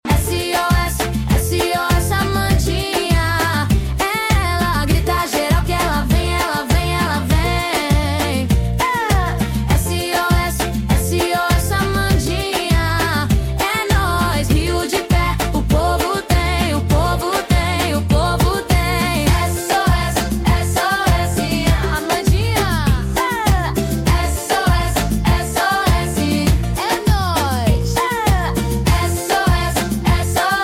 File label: Ring Tone